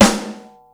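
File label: snare rock open, Yamaha Motif ES drum machine; 300 Drum Machines; Yamaha Motif ES-Series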